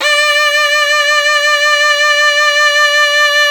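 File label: SHENAI, Shenai (Vib)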